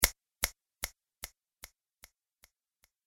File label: Finger Snap With Slow Echo; Body Sound Effects; Royalty Free Human Body Sound Effect - Human Sound Effect Production Element; Andrew Fleming